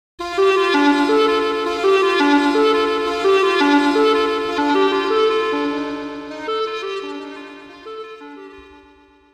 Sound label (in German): Geisterschloss Klangstueck